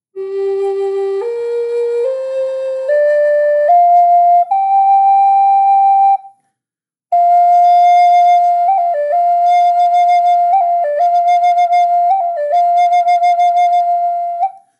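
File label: natural, flute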